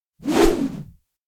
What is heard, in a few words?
racket fly; anemoi